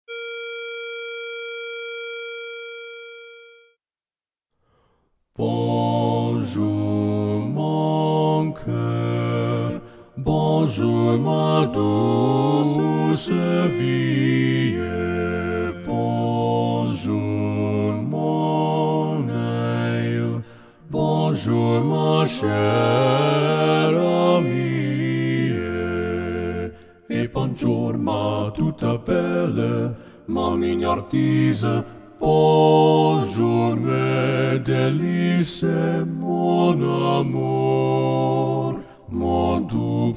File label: Bass